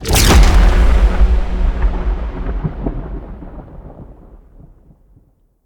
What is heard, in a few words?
Reclaim Beacon Strike; Synod; Ships